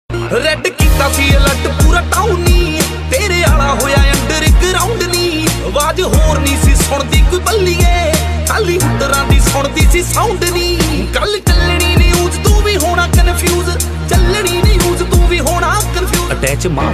song ringtone punjabi song ringtone

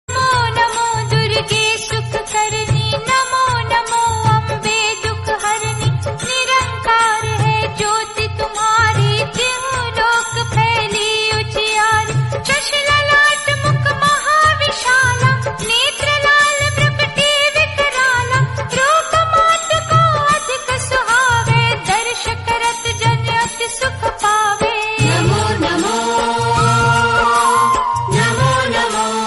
durga puja ringtone bengali durga puja ringtone; zedge

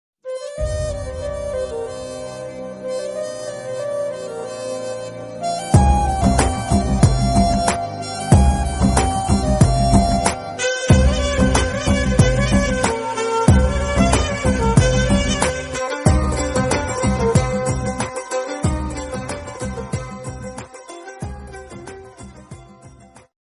love bgm; ntr; Rabasa; 23rd movie; telugu

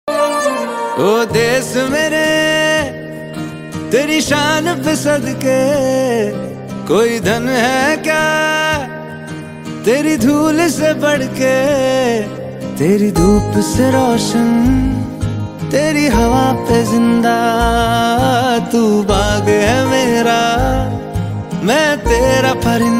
ringtone desh bhakti song ringtone

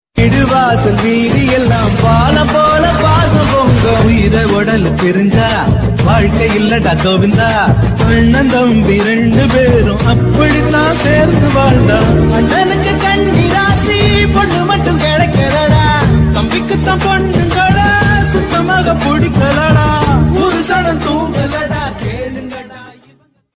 tamil music tamil love song; anna thambi; instagram

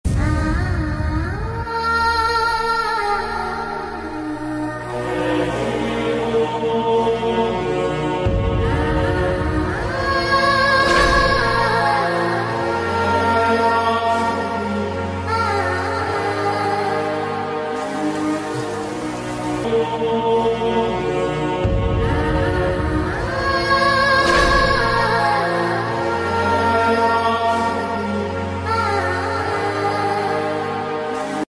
hindi ringtone music; magadheera

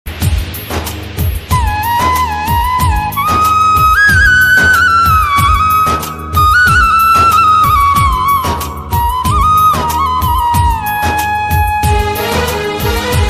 odia sad ringtone; himansu creation

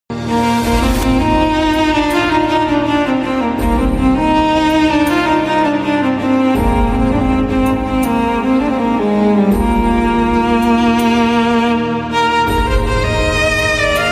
violin bgm; kesari movie

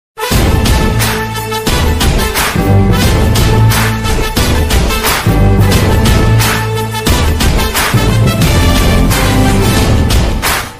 original background music in tamil; manmadhan

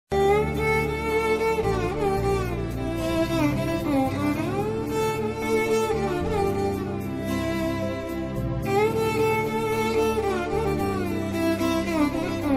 violin bgm ringtone, love violin bgm; madrasapattinam

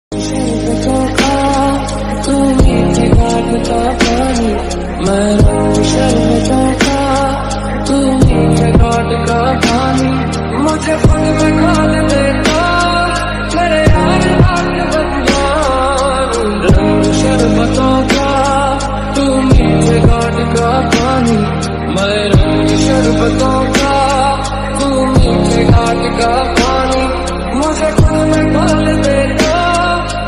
Hindi ringtone Love ringtone romantic ringtone

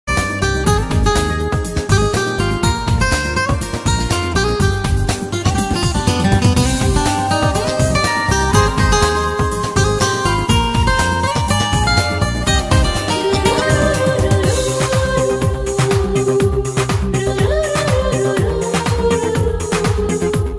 sad new odia ringtone odia song

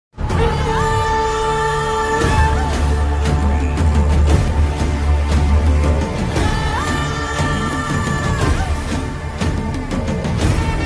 title bgm ringtone

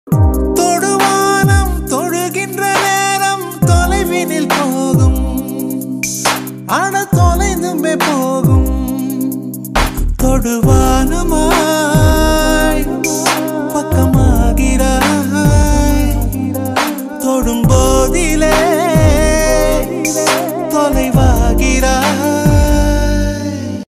sad song; anegan

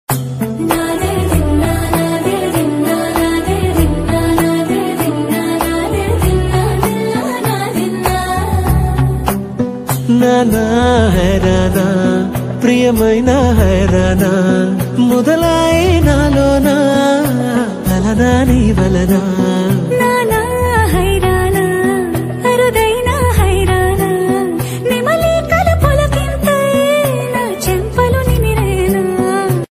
song ringtone

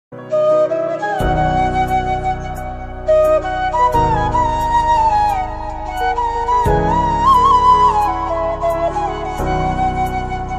flute ringtone, bansuri music ringtone

